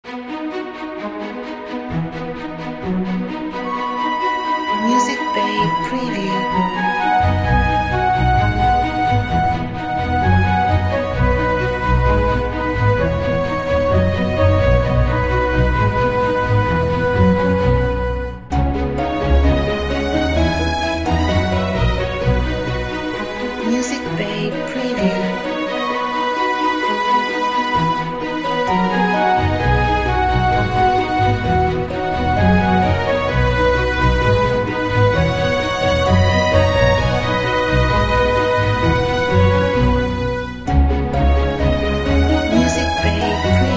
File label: Good and Cheerful Morning